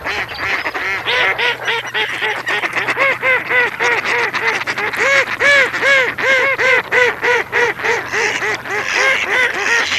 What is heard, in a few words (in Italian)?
GERMANO